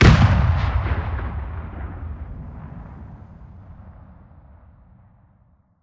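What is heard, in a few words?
Reaper Nuke Destruct Explosion Distant; Titanfall 2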